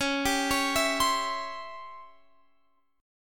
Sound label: Db Minor Major 7th Flat 5th Db down Guitar Open F; arpeggiated